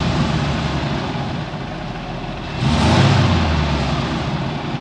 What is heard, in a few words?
Cadillac, Low Revs